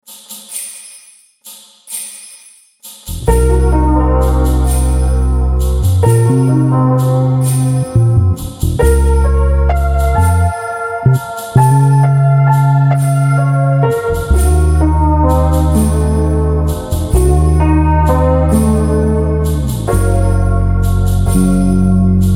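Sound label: Locrian natural 6 groove, bass; a bassists guide to modes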